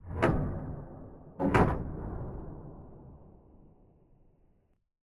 Spacecraft Bay Door Lock Down Large; Bottle Rocket Fx; Cyborg Collection

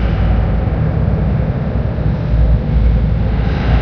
ambience; hl2; kraken

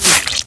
shoot, antlion guard; hl2

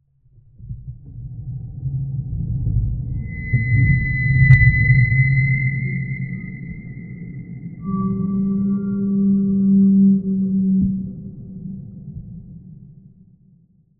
Cinematic Metallic Riser, Trailer, Designed; Jake Fielding; Haunted Metal Vol.2; Cinematic Creaks & Risers

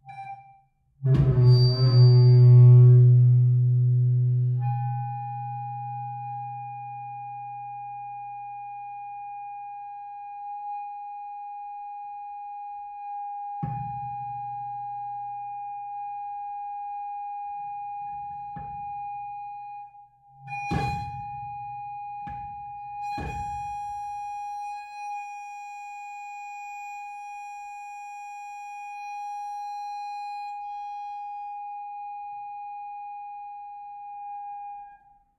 Feedback Tones High Long Front; ORTF; Pole Position

